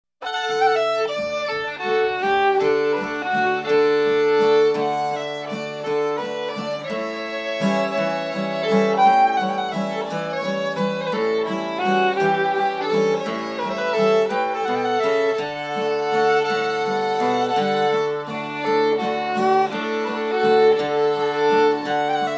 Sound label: Waltz